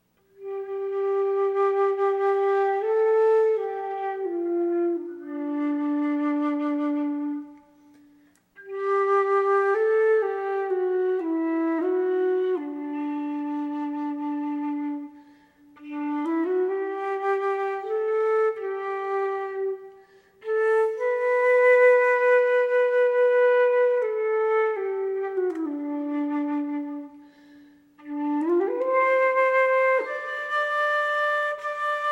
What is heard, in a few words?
Flute; Feminist Bookstore